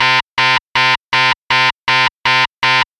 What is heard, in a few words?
Klaxon Dry